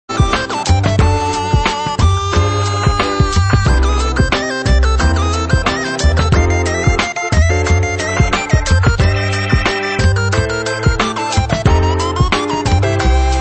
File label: groovin synth sample